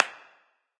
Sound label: clap; smite; hera